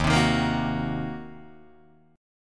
D sharp Minor 6th D sharp, down, Guitar, Open D

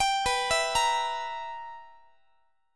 B Major 7th Suspended 4th Sharp 5th, Guitar chord; BM7sus4#5; arpeggiated; x,x,22,21,20,21; 4A,4E,5A,5D,5G#,6C#

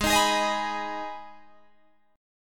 Minor, Guitar, Drop D; Gsharp; 18,18,18,20,21,19

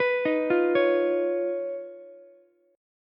Eb 7th Suspended 2nd Sharp 5th Eb, Ukulele; Eb7sus2#5 arpeggiated; JGuitar

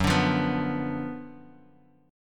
F sharp Major 7th Suspended 4th Sharp 5th; 14,17,15,16; strummed

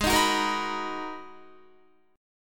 Ab Minor 9th Ab, Guitar; strummed; 4F,4A,5C,5G,5C,6E; JGuitar

